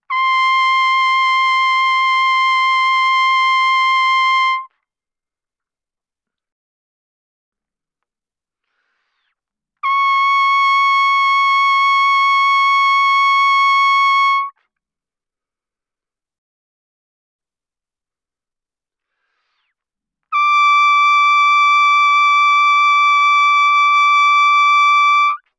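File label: Trumpet vibrato mf C6 D6; Brass; Bbtrumpet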